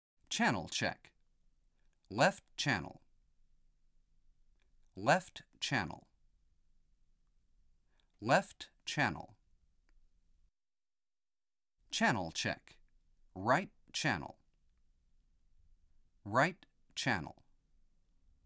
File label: Channel - L, R． (Emphasis On important Note warning High Level; Denon Audio Technical CD